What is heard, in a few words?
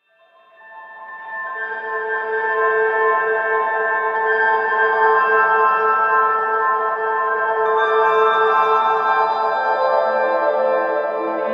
Map Piano; No Man's Sky